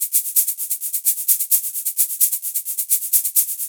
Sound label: Live Percussion, 130 BPM